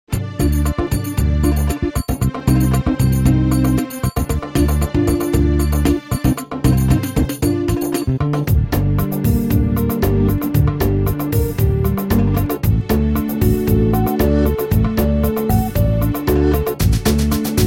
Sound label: set arabic